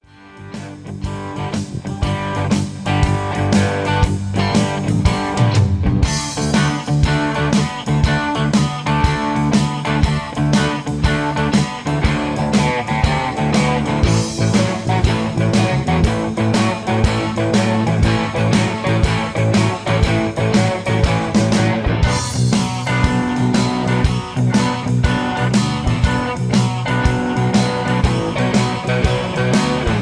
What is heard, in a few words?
I-V-VI-IV Texas Boogie D 120; Peach Jam Vol 1 Part 2 Texas Boogie